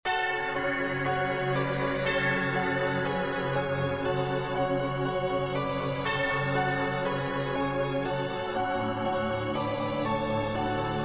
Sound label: misc graveyard